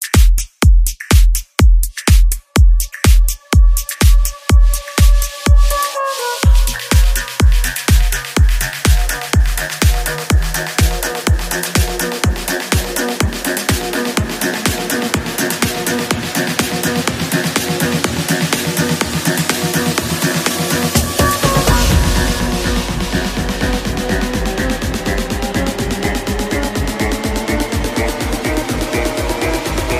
tam tam

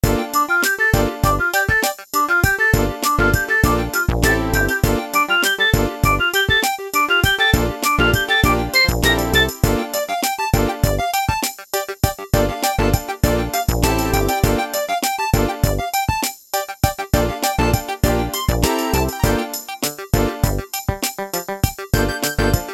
Jig

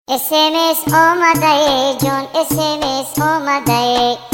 Balochi Sms Tone

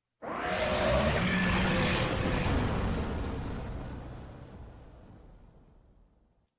Rhino Roar; warframe

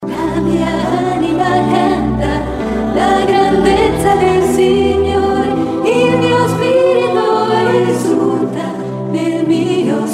Soul Sing

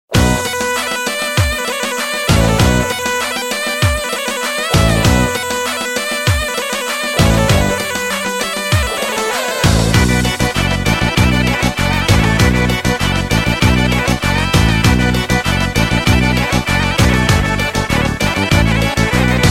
Balkan Turbo Folk

